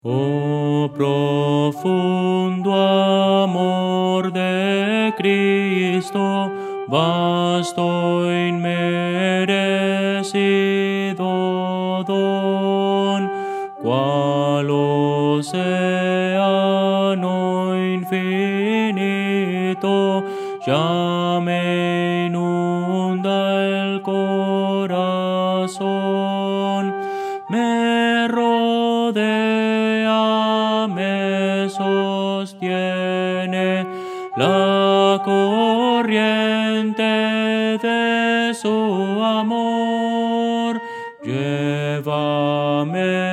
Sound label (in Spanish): contralto; oh profundo amor de cristo